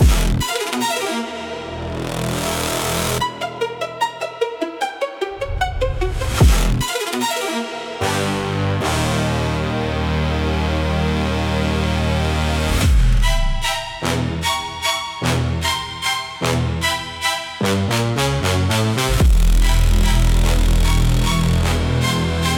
Shatter Pulse, aggressive panic for direct fear and jump